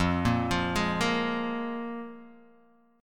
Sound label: F Minor Double Flat 5th, Guitar, Open D